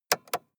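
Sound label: sports car interior switch on release; verkehr transport; autos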